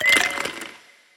respin block break slow; medallion Desktop; Fantasma

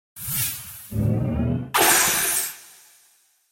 Activate; medallion; Fantasma

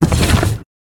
barrier; astro Legends Lyra and Erion Desktop; Foxium

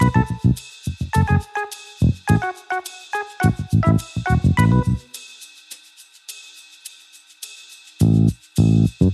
music lobby; riviera Star Desktop; Fantasma

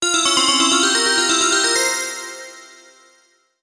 Win; Temple of Luxor

